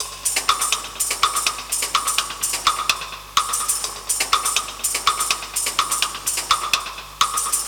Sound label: 125 Classic Breaks, 125